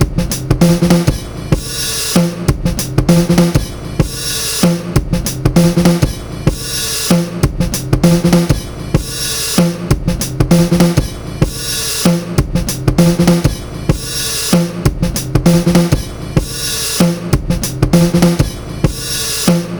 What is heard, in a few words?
40 CATCH 22 97 bpm, Loops Breaks; POTBreaks